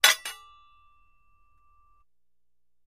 Axe Clinks, Dull; Single Medium Clink With Metal Scrape. - Metal Scrape